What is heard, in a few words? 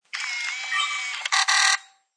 Turn On Camera, Turning on digital camera; Cameras; Electronics